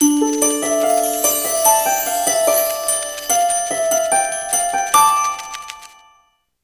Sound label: Game Win; lernerfolg vorschule prinzessin lillifee; nds